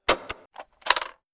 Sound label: Boombox stop; Zomboid; True Music; Tsarsound